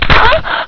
fall; openffa

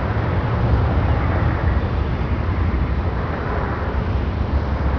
amb

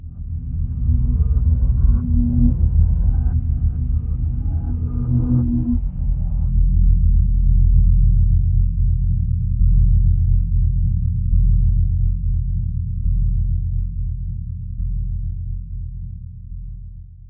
atmos; spawngirlsounds

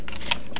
load pump; gunslinger

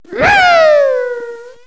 death

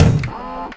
egg gun; chicken

